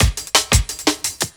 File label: LOOP, Drum & Bass, 174 BPM; Ueberschall; Drum & Bass Flash Trackz